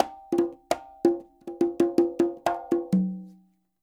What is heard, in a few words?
SF LOOPS 80, Percussionworks; Sampleheads